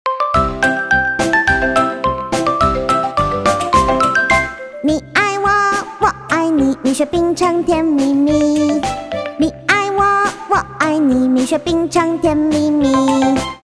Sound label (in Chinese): 女 中文歌曲翻唱 女童声